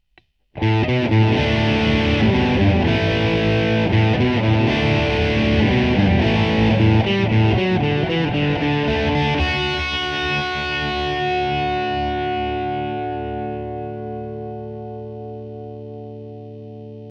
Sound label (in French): disto delay, guitare